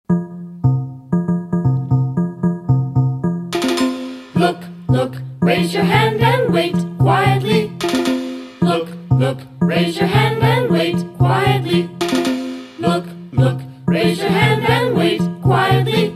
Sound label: DRUMS Song; get the persons attention